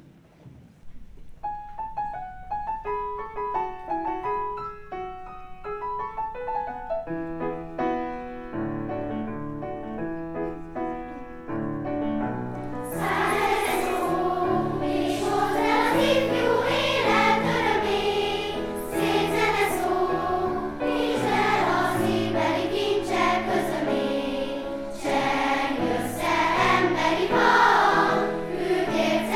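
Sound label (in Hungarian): enneklo ifjusag iskolak osszevont korusa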